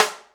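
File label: snare, EMU Orbit-3 drum machine; 300 Drum Machines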